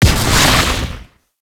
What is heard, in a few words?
Mine Proj Explosion; Warframe; Venus; Venus Commander Orange; Abilities